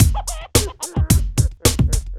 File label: low funk, drums; Loopasonic